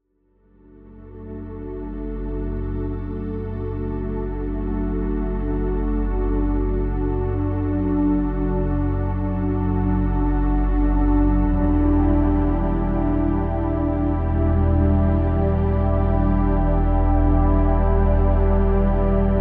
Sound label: Deep Sleep Relax